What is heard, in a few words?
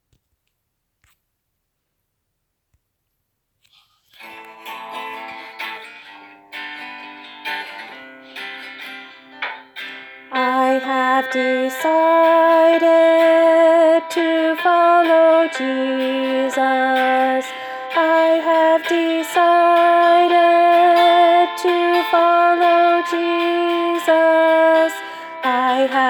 Alto Voice